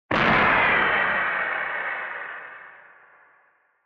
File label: Retro Anime Gun Low